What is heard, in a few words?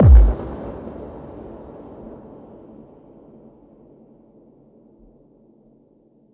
FX Kicks